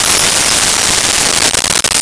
Bell Tree; Nintendo; July 2020 Gigaleak

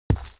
light dirt, dirt hits

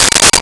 Agogo; Nintendo; July 2020 Gigaleak

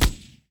m60, chain gun fire; weapons

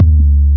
KIK 808 jungle, c4; BGM; Nintendo; July 2020 Gigaleak

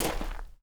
dirt run, footsteps; chief

